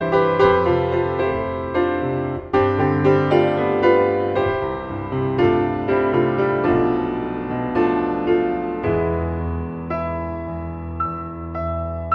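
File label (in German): Improvisation am Flügel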